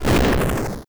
Fire; Elemental

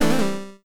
Accept, Menu; TowerDefenseGame

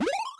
Bonus; TowerDefenseGame

